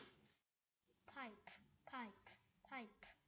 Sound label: pipe